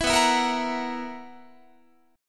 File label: B Major 7th Suspended 4th B, Guitar; strummed; 19,19,19,20,22,21